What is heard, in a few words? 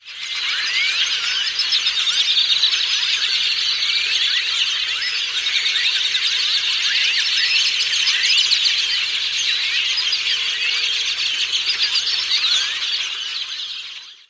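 roost cacaphony, bird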